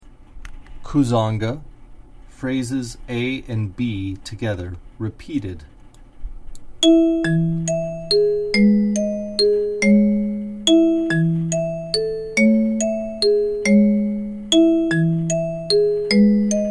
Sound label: Kuzanga Prog A B, kalimba